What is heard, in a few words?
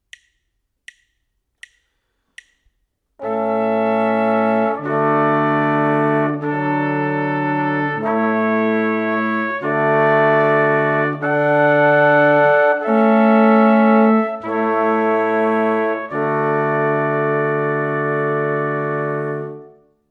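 harmonic dictation, brass quartet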